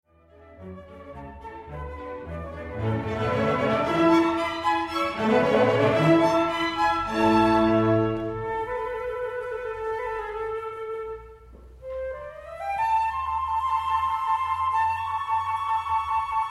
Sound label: cadenza